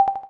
Xylophone

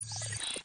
technology down; Oppo; A5s; ui

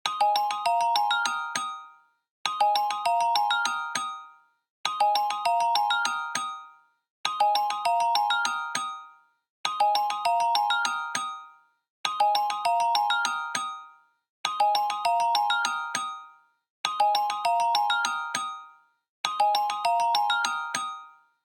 Simple Motive, ringtones; LG; Wing 5G SKT

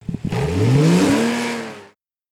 Stereo Sports Car, notifications; Motorola; Edge 70 Max China

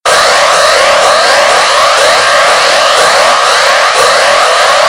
Alarm Clock; Coolpad

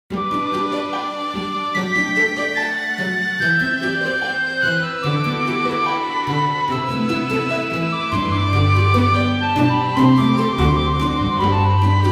Wood ringtone; Nokia; audio themes

